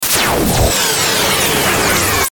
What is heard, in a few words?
Stinger Short Zap Descend; Production Element Imaging Element Accent Transition